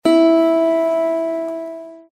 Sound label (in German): Zithermacher Ton 8 LAUTER